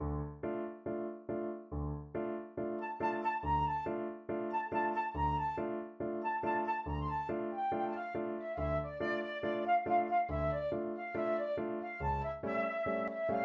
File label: barber seville overture flute